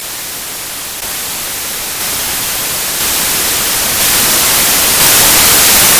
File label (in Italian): Rumore bianco intens crescente